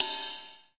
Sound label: Korg Ride Cymbal